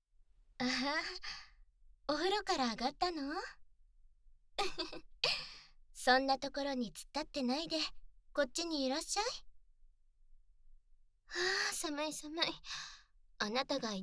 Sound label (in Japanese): ムラムラ耳舐め; お姉さんの耳舐めがないと僕はもうダメみたい。